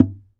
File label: SAZ TAP; Spectrasonics; Hans Zimmer Guitars VOL-2